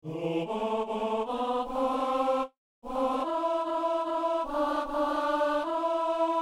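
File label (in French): psaume, ténor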